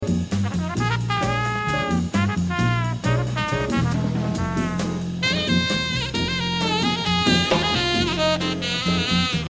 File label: shared motif